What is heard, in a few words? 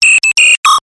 science fiction robot nano bot cute digital chirp beep; zapsplat